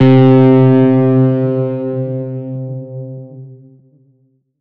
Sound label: Guitar 4 - C; Instrument Oneshots; 99Sounds; Monosounds